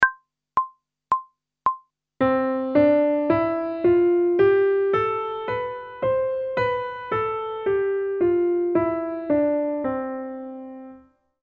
Major Scale one octave ascending and descending, pop vocals; grade 5; Technical Work